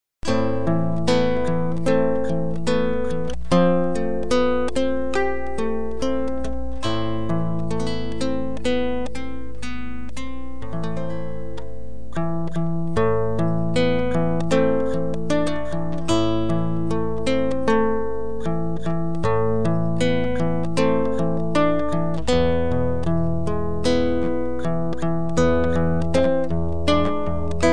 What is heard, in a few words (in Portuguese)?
versao violao